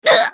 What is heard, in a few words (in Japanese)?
スカウト女 被ダメージ